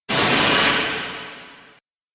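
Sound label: Science fiction Horror Impact; Sf Horror Impact; Miscellaneous Impact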